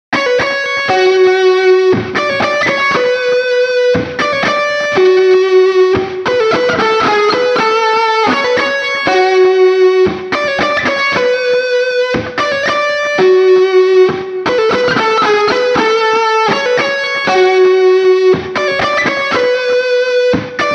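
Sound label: Intro Lead Right, guitar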